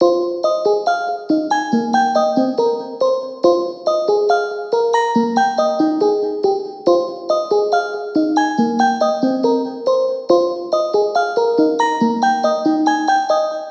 Melody F 140 Crystal, Color Bass Essentials, Melodic Loops; Ghosthack - Color Bass Essentials